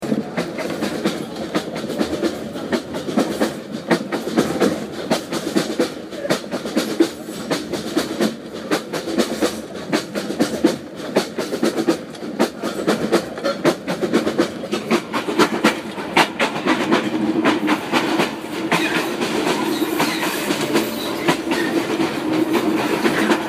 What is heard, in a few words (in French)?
train lancé